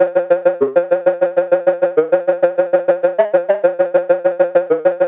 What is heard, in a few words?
XPANDER; AMG - Vince Clarke Lucky Bastard